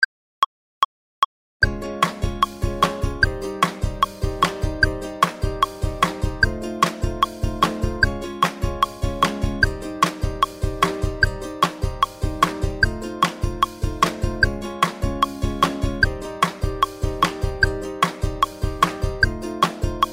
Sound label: Happy Strumming Chorus Full Tempo